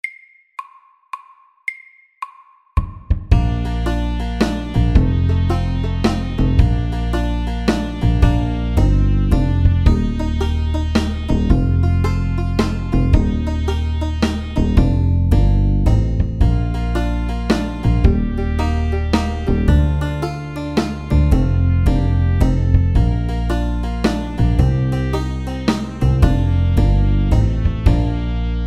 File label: Country shuffle MEDIUM 110